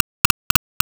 crash; ゆめ2っき

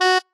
marimba bat

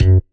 marimba; ゆめ2っき

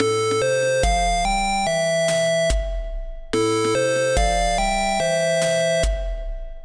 alarma beat; ゆめ2っき

